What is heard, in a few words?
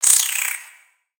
Spider Idle Bored; Lego; creatures mechanical